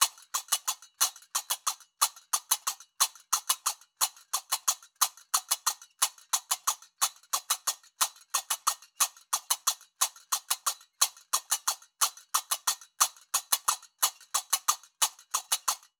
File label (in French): loop ternaire tempo 120; Qaraqab; qraqeb; Maroc